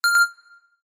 button

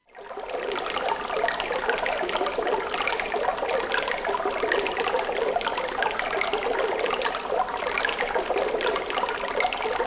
stream, nature

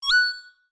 button

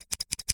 scissors

